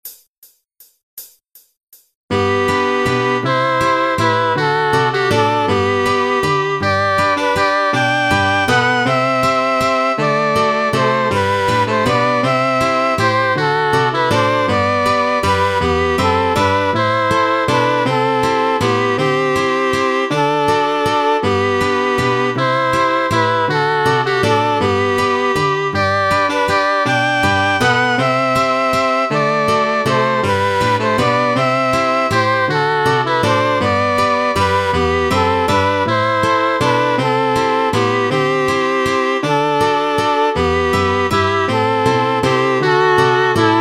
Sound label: Waltz; Stamford